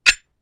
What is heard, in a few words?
Horror Transition Sound; Vtkproductions